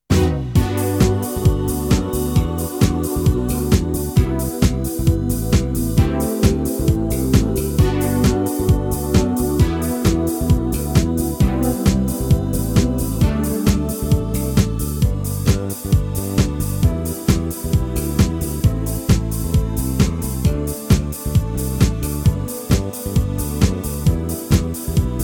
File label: smooth G; key - G - vocal range - B (optional A lowest note) - E; Super punchy live recorded smooth arrangement